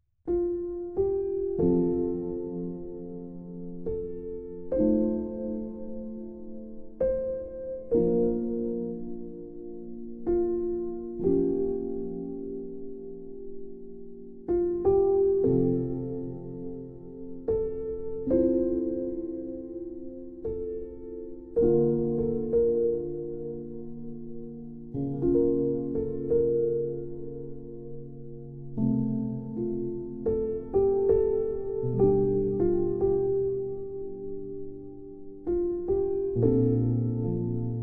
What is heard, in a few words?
Solo Piano; Better Man 2024; movie soundtrack